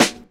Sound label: soft hitclap